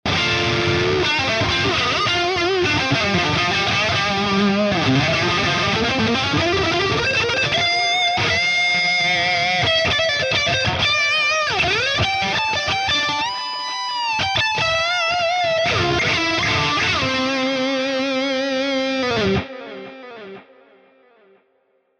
Lead; Archetype Abasi

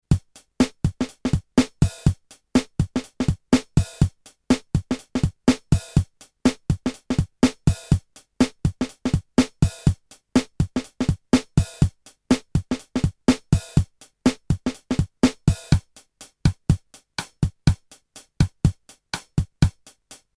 Roland TR 626 Preset Pattern

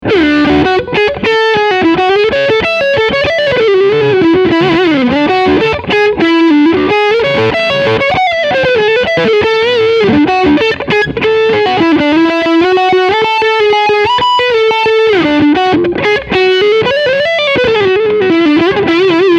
Lick Front HB

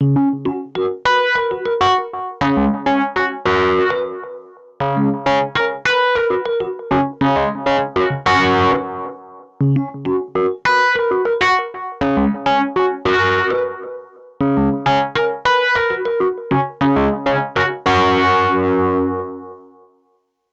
Korg SV 2 Rhodes Amp Twin Uni V Tape Delay

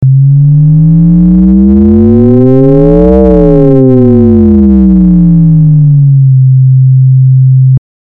Mod Int logFM

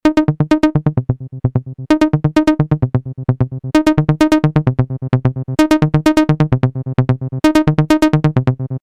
Saw no Reso short Acc Decay

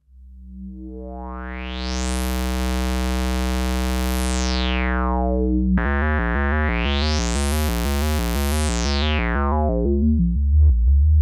Filter Filter Arp

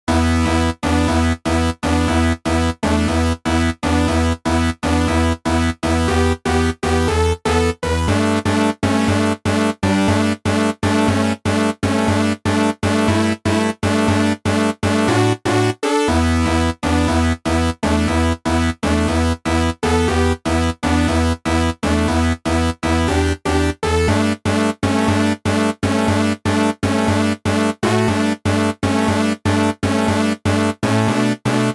V80 Heroic Brass

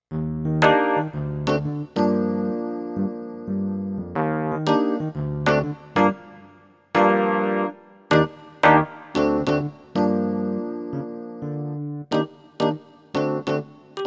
Korg EK50 Stage E Piano

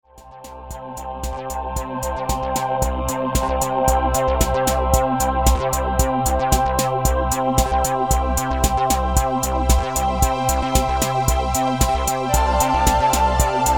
vp slow strings 606

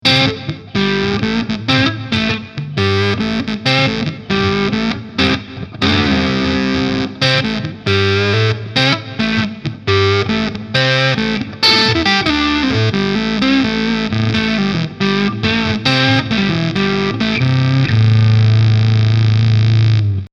gain oktaver Strat; 4pos

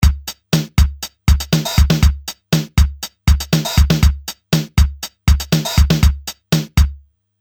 Casio RZ1 Loop

